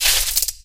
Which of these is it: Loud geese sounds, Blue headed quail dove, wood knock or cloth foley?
cloth foley